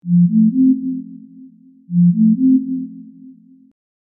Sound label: Bluetooth pairing tone headphones connection; Headphones and Speakers